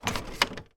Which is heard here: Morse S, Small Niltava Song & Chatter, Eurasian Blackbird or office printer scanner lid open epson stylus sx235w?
office printer scanner lid open epson stylus sx235w